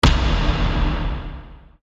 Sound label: Node, percussion; revealed percussion vol 2